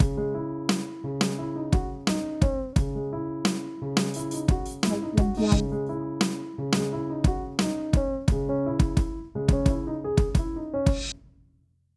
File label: Loops